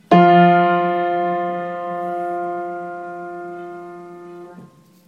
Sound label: Sangler F3 F4 pure 4 2 just octave